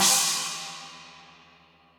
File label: CHINA 14 edge crash, Cymbal; Ableton; Factory Packs; Drum Booth